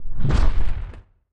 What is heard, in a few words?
BIG SWING IMPACT